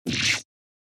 Cut

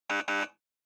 Quiz Buzzer Multi; クイズ

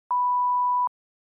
Censor Bleep 1kHz Mid

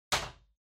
Lighter Far Put

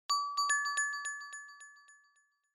Smartphone UI Sound Dry

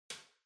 Light Switch Rocker Far Hall Mid

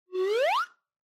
Slide Whistle Mid Up

